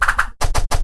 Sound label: Shuriken